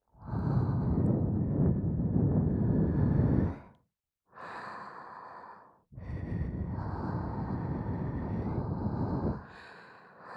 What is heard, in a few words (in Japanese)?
吐息 耳ふー×耳はぁ～